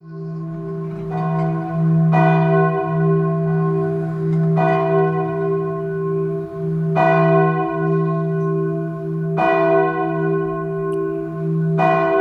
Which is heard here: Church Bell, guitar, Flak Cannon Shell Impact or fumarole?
Church Bell